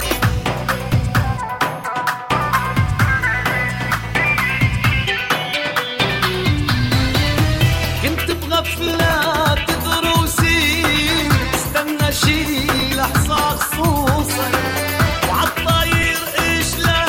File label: yaa ali arabic